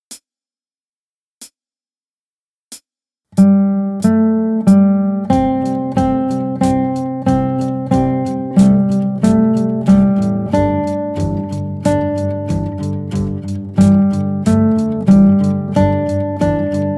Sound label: blues